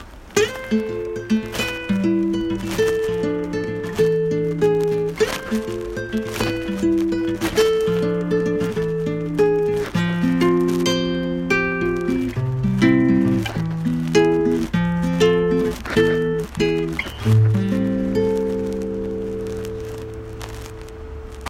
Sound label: AC200, klassicheskie gitary; Аудиозапись AC200-1